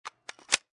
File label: Pistol Semi Auto Clip Inserted Empty; Pistol: Semi Auto, Clip inserted, empty. Version 2; Gun Handling; Lynne Music